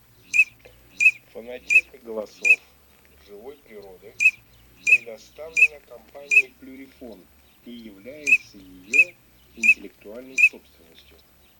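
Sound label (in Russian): Чирок-свистунок Alzavola, манок; plurifon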